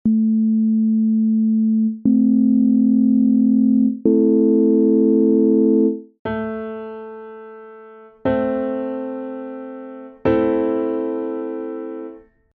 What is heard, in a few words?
music